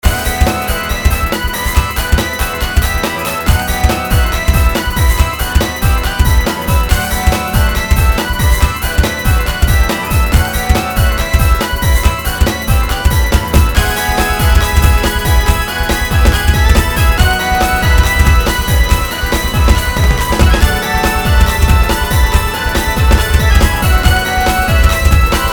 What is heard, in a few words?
guitar fun; the failed tv miniseries